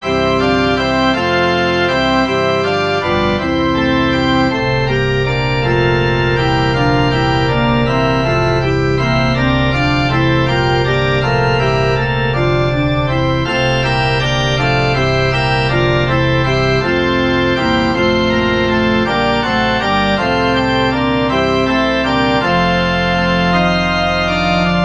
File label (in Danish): forspil; ddk 140 fred til bod for bittert savn